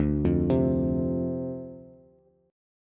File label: D-Minor 7th D Bass, chord; Dm7; arpeggiated